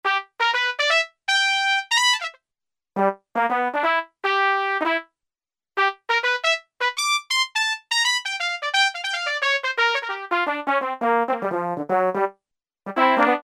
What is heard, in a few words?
Trumpet, Kawai K1m; Factory patches - I banks